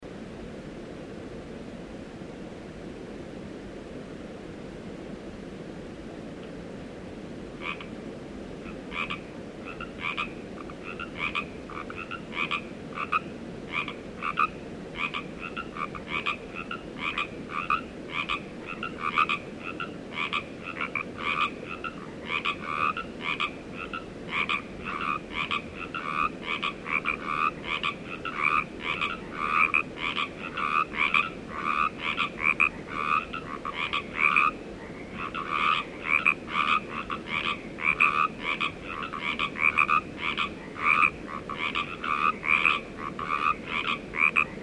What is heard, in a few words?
Frogs